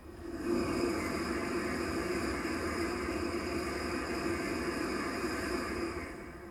新幹線エアトースあり

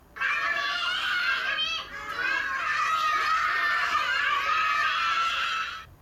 園児エアトースなし

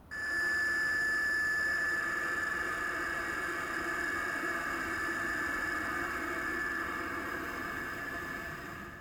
ジェット機エアトースあり

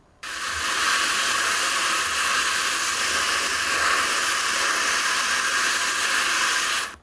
高圧洗浄エアトースなし

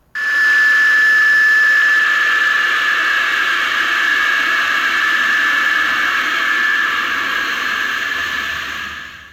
ジェット機エアトースなし

